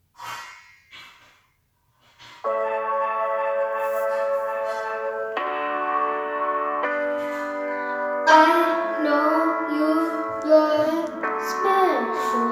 Rainbow Song Sung

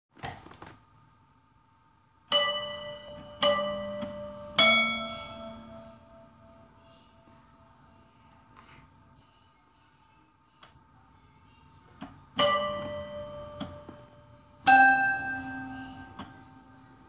Happy Birthday to You Played on Piano

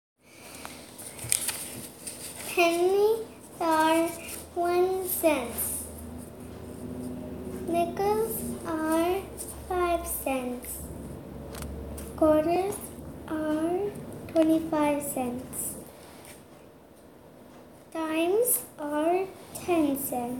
Coins; US Money Recognition

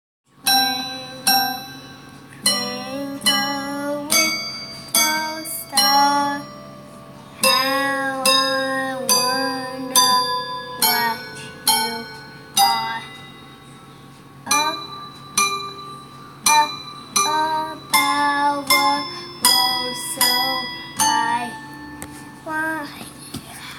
Piano; Twinkle Twinkle Little Star How I Wonder What You Are Played on Piano

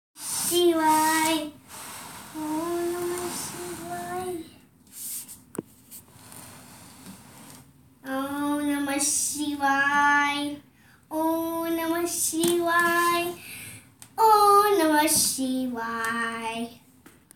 Aum Namah Shivaya Mantra Sung

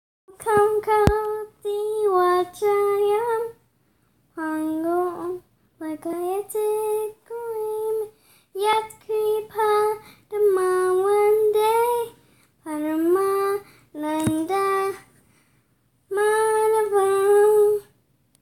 Mookam Karoti Vachalam Mantra Sung

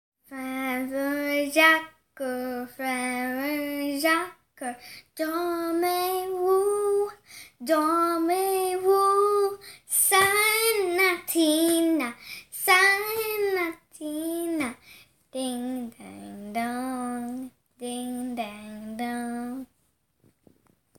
Frère Jacques French Nursery Rhyme Sung

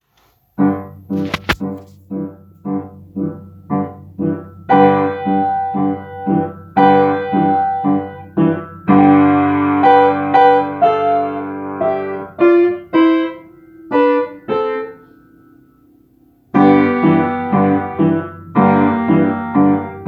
Boxcar Rumble Played on Upright Piano

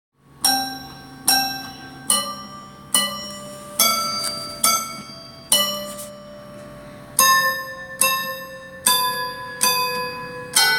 Piano; Twinkle Twinkle Little Star How I Wonder What You Are Played on Piano

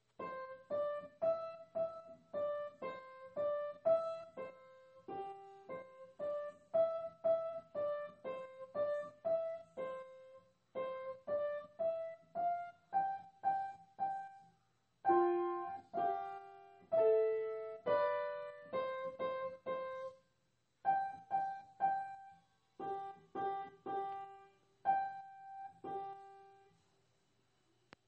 Alouette Played on Upright Piano

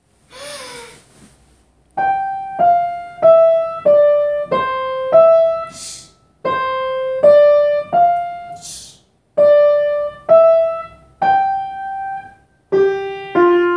Princess or Monster? Played on Upright Piano

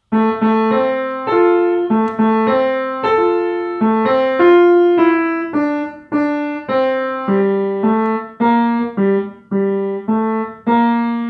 Lullaby Played on Upright Piano

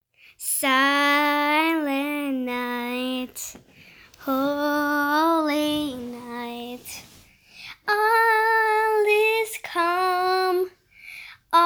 Silent Night Christmas Carol Sung

